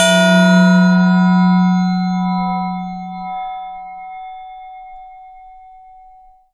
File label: Clang